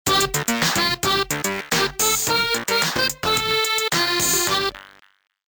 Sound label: Transition